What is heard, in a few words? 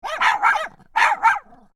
dogs x2 barking small; Animals; Dogs Barking (2X Small) 2; McKinney Sound